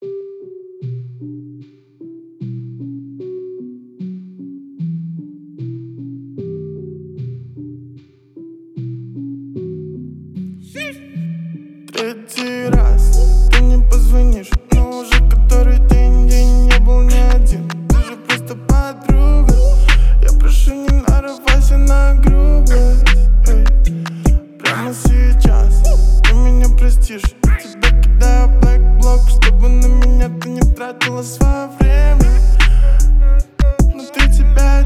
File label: 41-44-46hz Low bass